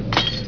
GLASS, breken

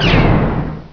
BANG, Gunshots; sound effect

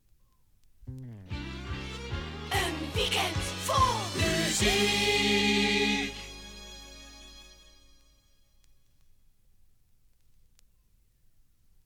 topformat maxi jingle single

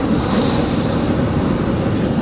flamethrower loop

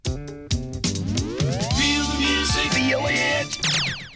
topformat maxi jingle single

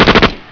GUN

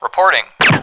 Sniper; (Gun)shots

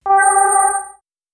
Wrong answer; Rosegarden; russia

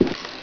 STEP

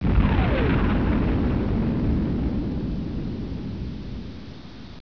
flyby; sound effect

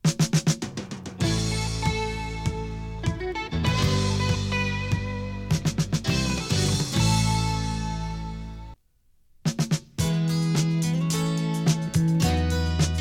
topformat maxi jingle single

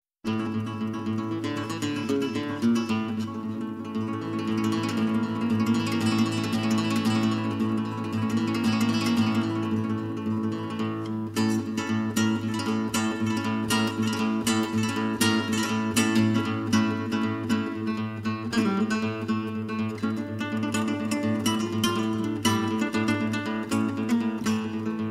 Casa Flamenco; Media Music Ltd; Short Cuts From Around The World

